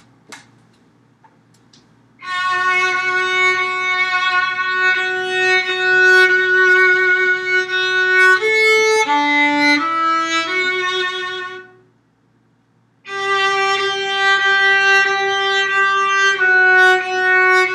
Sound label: Jingle Bells